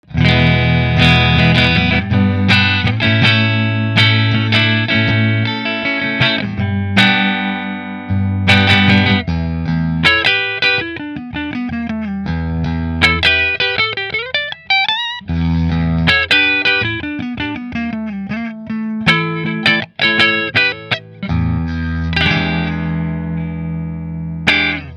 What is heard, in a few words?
Rahan koa neck fender